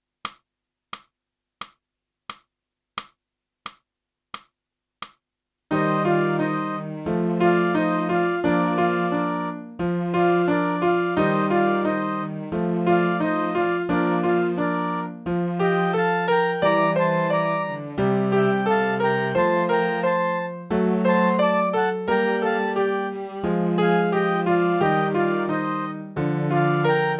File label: clarinet; boosey method